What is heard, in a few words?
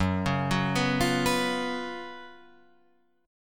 F sharp Major 7th Suspended 4th, Guitar, Drop D; chordshape; down